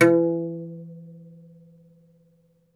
OUD E2, Plucked Strings; E-MU Producer Series Vol. 7 – Old World Instruments

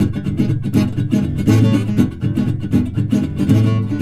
Blues Guitar Samples, riff; ILIO; Fretworks; SIMMS RIFF

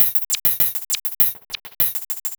Investigator Hats; Sonic Foundry (Sony Creative Software); Loop Noir; Session 08 - Psychic Investigator

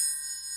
PRC TRIANGLE, Orchestral Perc; Roland LCDP03

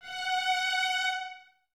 Violins Detache F#5; Miroslav Vitous; String Ensembles

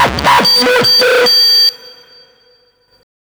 CYBERGUN R, Hard & Loud Techno; USB Soundscan vol.01; AKAI

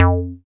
Bass SH-101

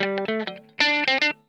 STEAMIN, Funk Guitar; Zero G - Funk Guitar

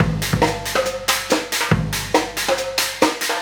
Trap Kit, Drums

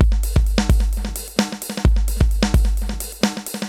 Space Echo HR-16B 130BPM

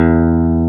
DRY GUITAR; E-MU Formula 4000 Series Vol. 3; Analog Odyssey

